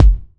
Kick, Kickdrum; Equipped Music; Loungin House

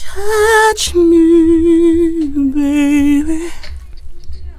FEMALE VOX, Hip Hop

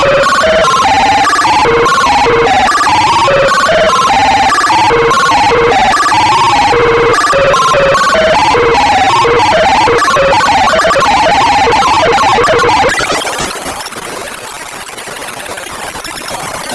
ARP 2600, SEQ; TECHNO-BOX

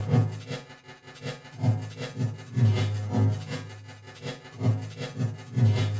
Noise Beat; Beats; Drums & Drones

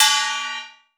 Kwaengwari p; Cymbals

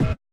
hit, Roland MC-909

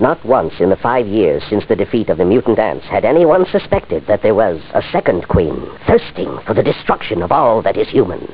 voice; Amiga Soundtracker Sample Packs

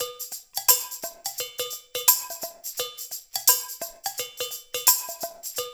percussion loop

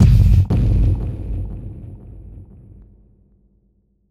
Impact; impact samples

